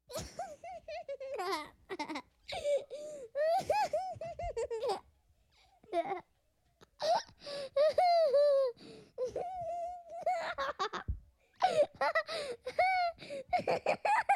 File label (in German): kleiner junge, Lachen